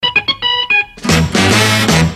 Alert; Brady

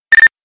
ring ringtone

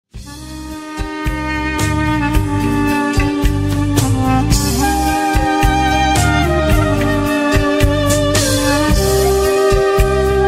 Kurdish Music

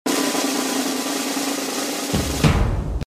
Beats

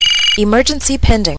Emergency Pending

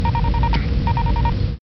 Incoming Msg

